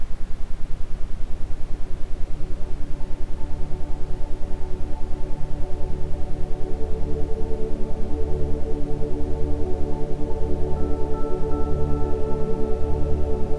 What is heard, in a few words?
isochronic, Ambient Sound; Gesundheit Immun Quickie